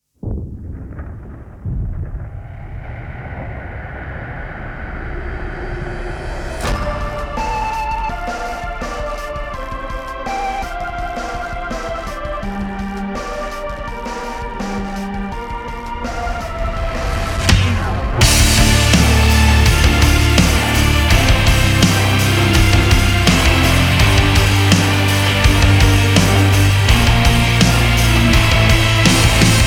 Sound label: noise cuts instrumental